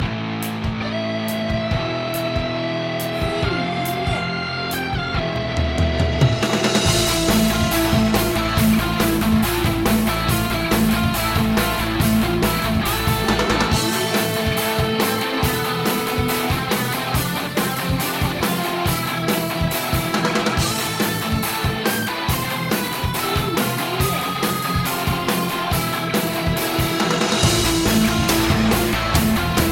boos 终极

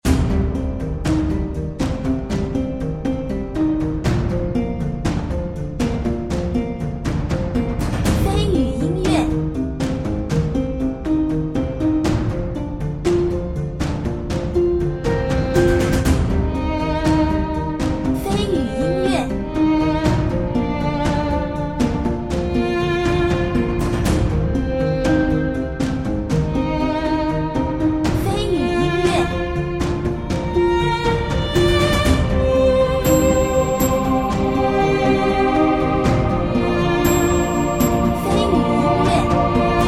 休闲-放松-悲情

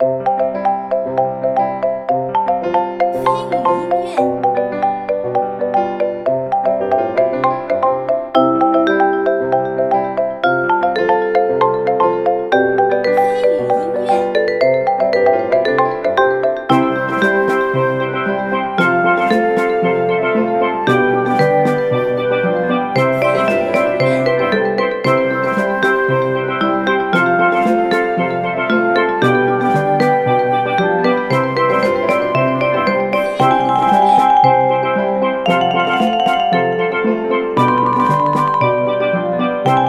游戏背景音乐

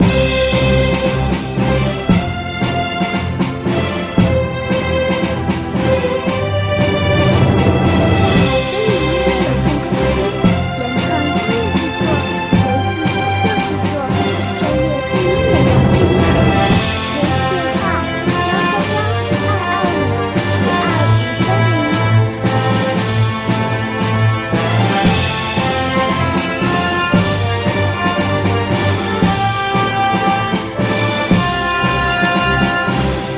雄壮激昂登录; 荣耀之门

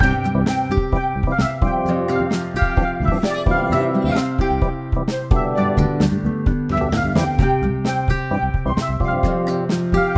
战斗－保卫农场b音乐

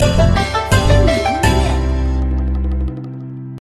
Win副本

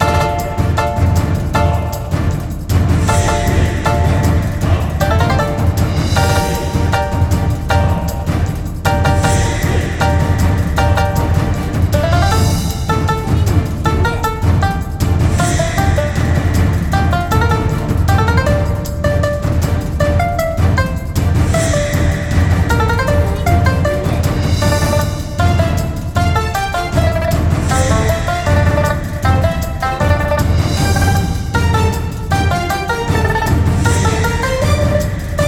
BOSS战战斗音乐

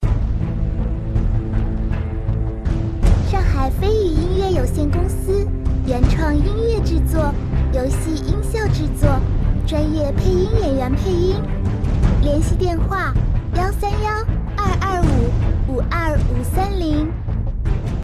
紧张压抑

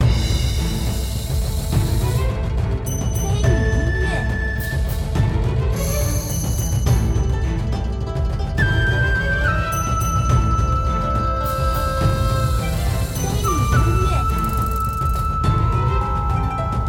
小boss战斗音乐

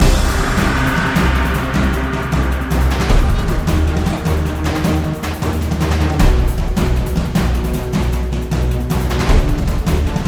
战斗BGM

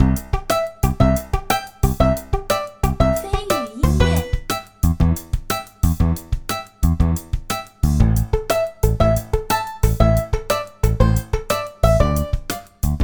老虎机背景音乐

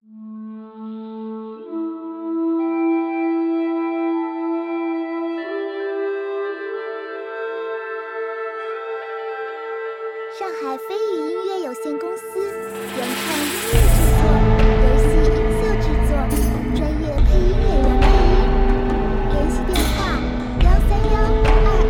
舒缓大气; 十万大山

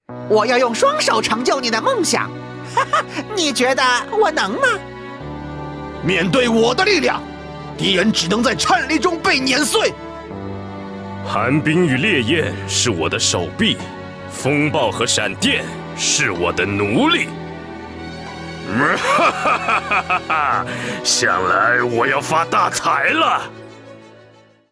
男 BGM

